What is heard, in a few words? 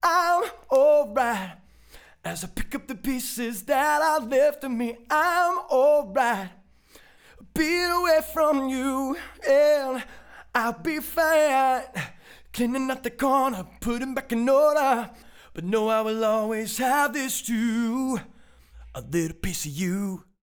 Lead Vox